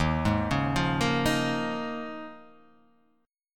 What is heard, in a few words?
Eb 9th Suspended 4th; Eb9sus4; arpeggiated; x,x,1,1,1,0,0,0; JGuitar